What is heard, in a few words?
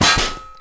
hit receive; gmod; lvs framework